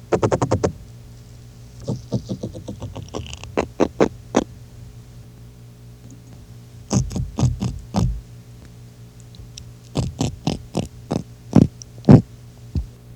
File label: conodon nobilis; barred grunt; Two specimens recorded at Puerto Rico in 1956